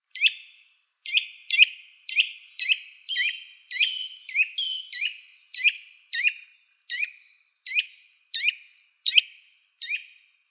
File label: Bukutis Eurasian Nuthatch Sitta europaea